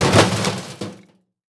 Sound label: Impact Metal With Debris Hit Cement Short